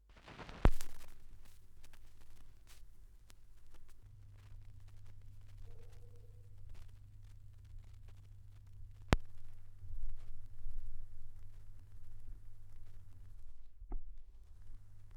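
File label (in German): schallplattengeraeusche, record noise; wendelrekords 1.1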